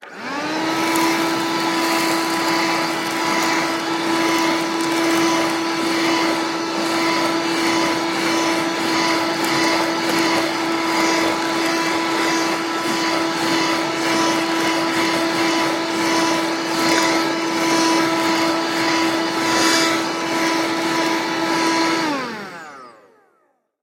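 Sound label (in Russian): zvuki ruchnogo pylesosa, handheld vacuum cleaner sounds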